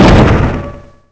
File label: Hit Gate